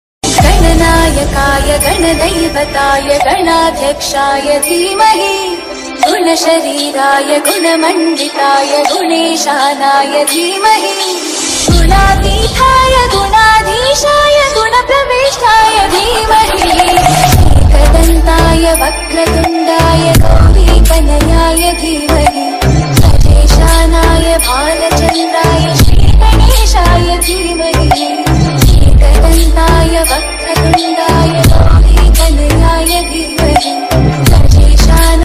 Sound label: ganpati bappa call ringtone ganesh chaturthi call ringtone bgm